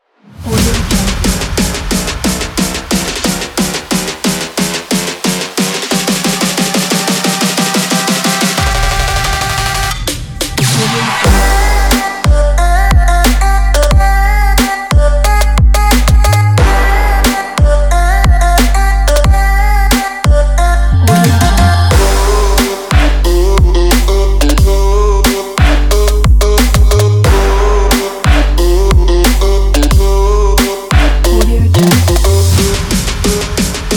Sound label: instrumental